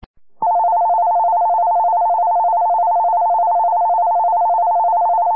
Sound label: sendaisenseki bell